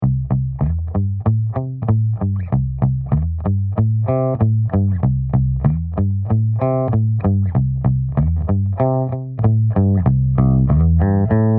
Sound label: palm mute